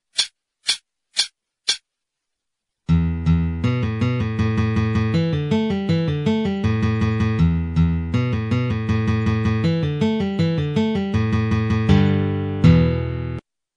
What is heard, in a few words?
Pull Off 160